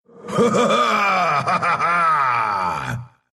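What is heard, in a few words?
laugh, Russian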